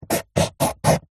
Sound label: office pencil paper hollow table draw square; zapsplat